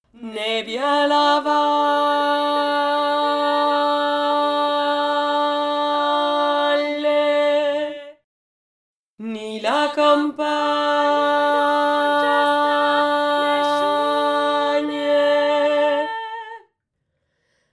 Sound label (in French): VOIX GRAVE; CASCA LULIVA